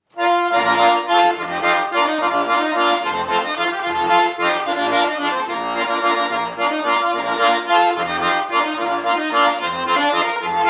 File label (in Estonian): Sitsikleit, loots folk; Kanepi; Karl Kikas